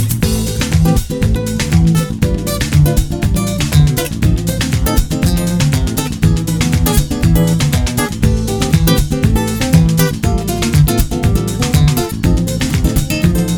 Beach Salsa Oppo A9x Ringtone

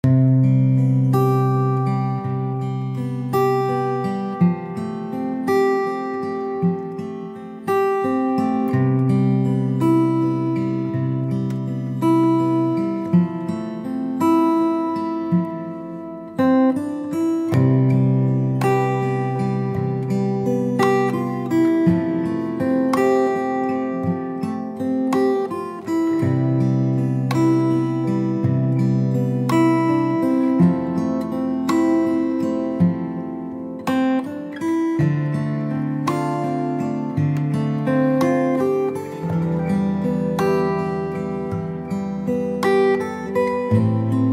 Romantic Classical Guitar Ringtone

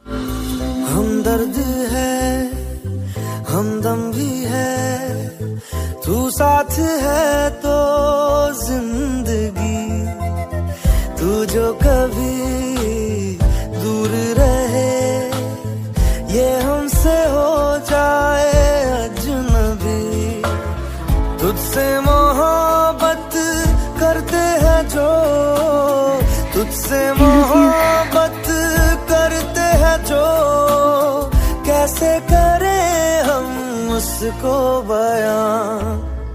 Hindi Ringtone

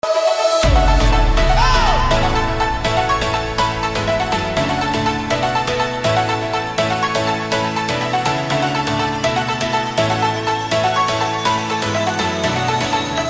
Instrumental Ringtone

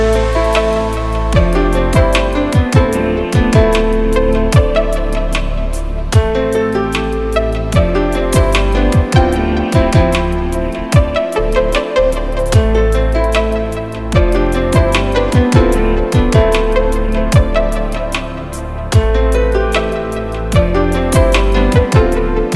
Beat Romantic Electronic Ringtone

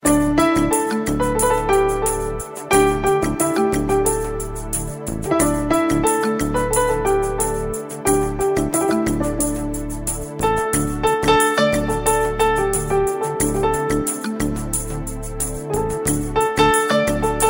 Hindi Instrumental Ringtone

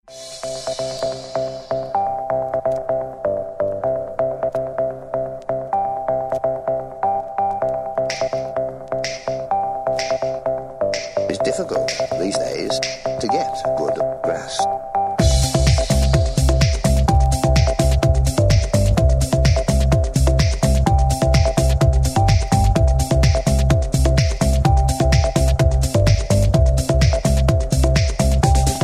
Ring Tone Cute

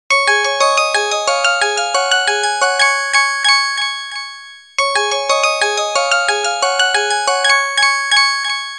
Bell; LG

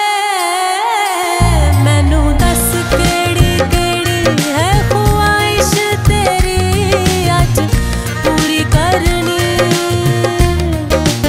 latest Punjabi Sad Ringtone

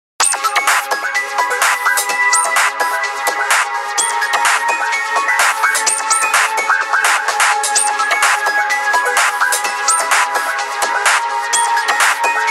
Htc Ringtone

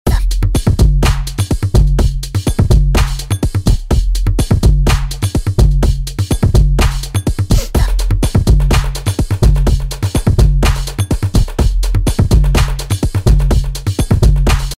Tik Tok Dj Ringtone

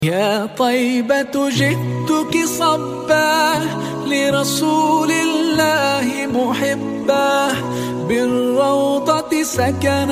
islamic Ringtone

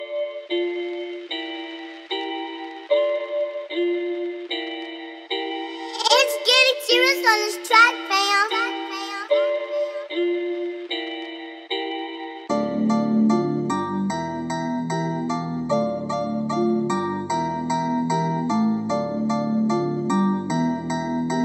iPhone Ringtone

